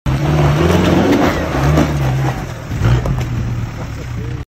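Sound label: Daihatsu, car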